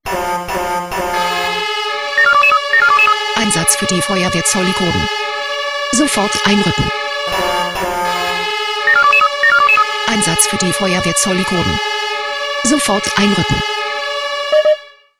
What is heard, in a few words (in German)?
SMS Alarmierungstone; FW Zollikon